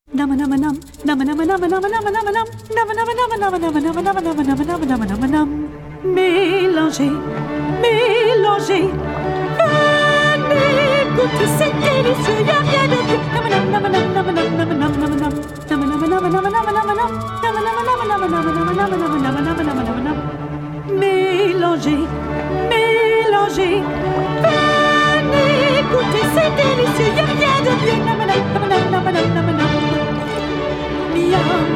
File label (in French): Les noces de Figaro vocale